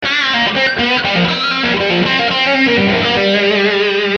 G27 119 lead

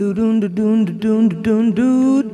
Vocal Echoes

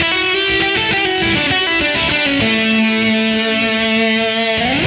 lead, 100

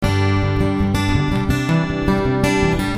Picking G

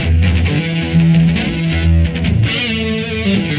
E5 134 rhythm; Loops

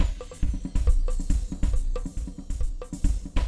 Jazzy Moods 138

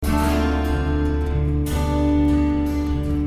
Picking G 74

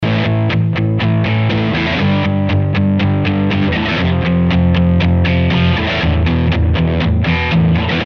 Interlude Rock Solid A

